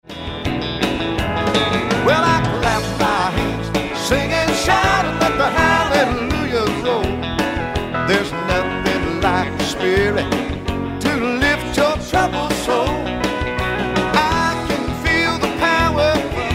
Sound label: Up Tempo 4 4 Country Gospel